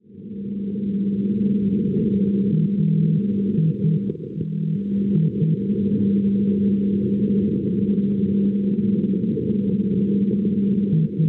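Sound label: non-directional beacon 390 kHz; AVI; Aviano; Italia; Schwarzsee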